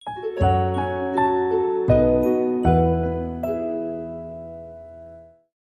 Oh Tannenbaum, Sting

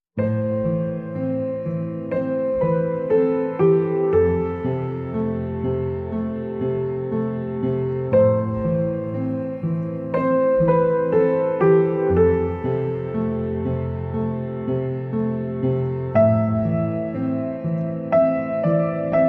Solo Piano Only Mix

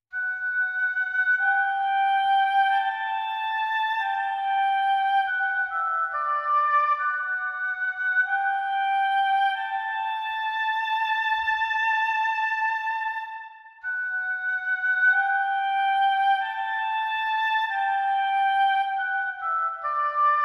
Woodwind and Brass Mix

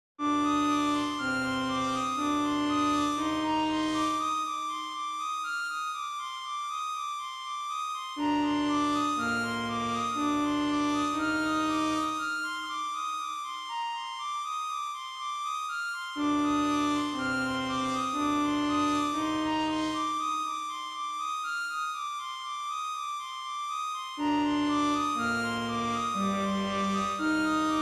Synth Patterns Only

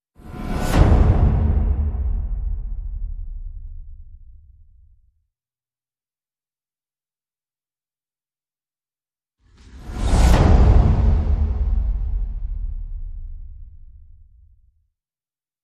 No Pads

